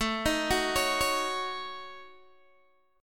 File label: A 6th Flat 5th, Guitar Open G, chord